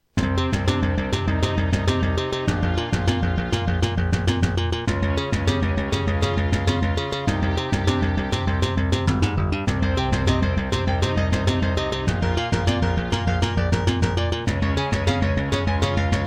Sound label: Serdaco Dreamblaster S2; Introduction; Hocus Pocus